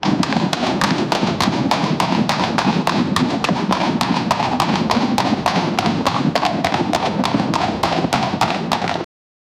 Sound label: army marching